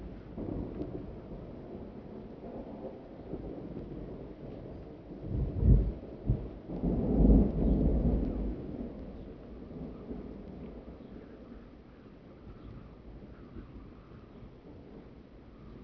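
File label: thunder internal perspective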